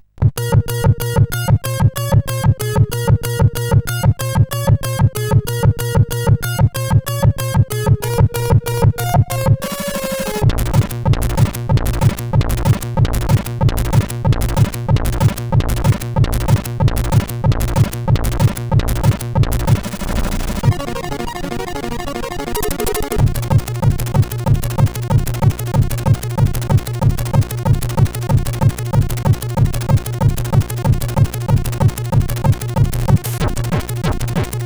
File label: Rotation sid CSG8580; hvsc